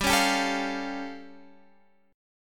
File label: A Augmented 7th G